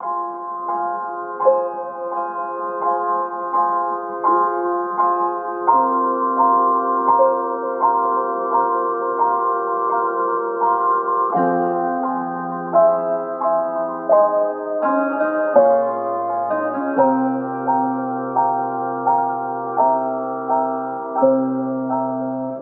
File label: piano, Loops Sad